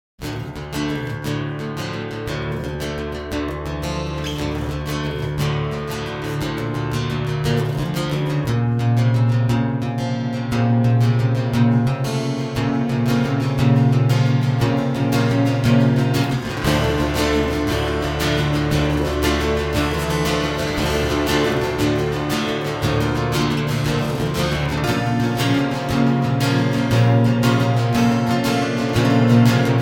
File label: Outro